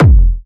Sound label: Techno, Kick; One Shots; GhostHack; Ghosthack - Free Techno Sounds 2024